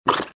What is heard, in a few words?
evil pain; CAC ASSETS